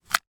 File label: household audio cassette tape pick up